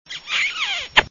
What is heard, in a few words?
kiss